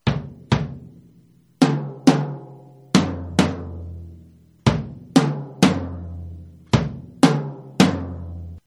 birch, drumline; CLASSIC BIRCH; BD22X16 TT12X8 13X9 FT16X16

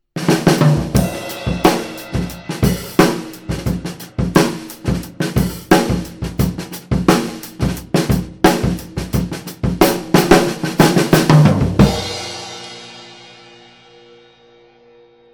skintone low, drumline

